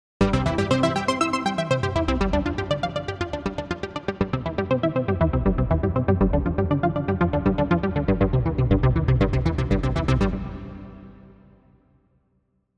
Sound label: Synthesizer NY String Hall